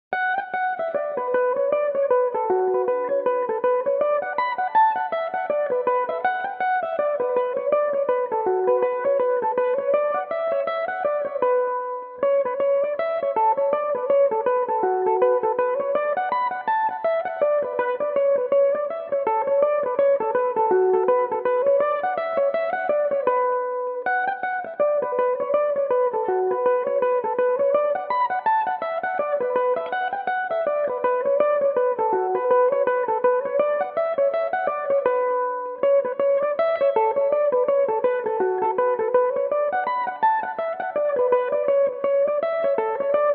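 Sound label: B minor, irish mandolin, tunes; glorias travels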